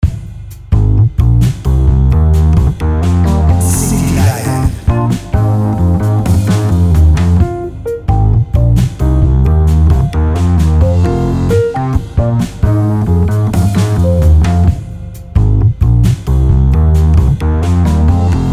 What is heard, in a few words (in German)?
Musik